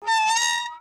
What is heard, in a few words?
fan squeak; gesource